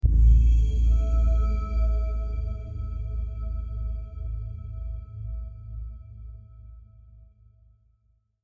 Day Text Sound

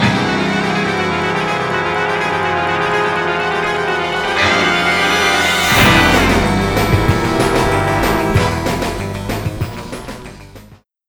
Loading Screen; Rooftop Rumble; SpongeBob SquarePants Creature from the Krusty Krab

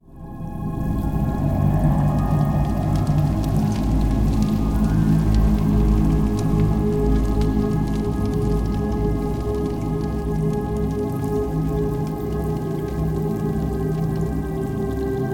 ambience, Cathedral; fate 2 undiscovered realms 2008 windows; Typhon

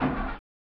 Ignition

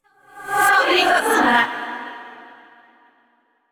laugh; yamiko

